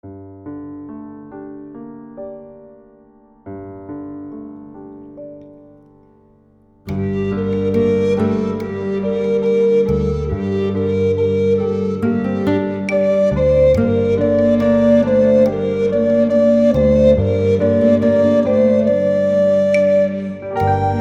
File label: Meditation; Jede Menge Floetentoene 1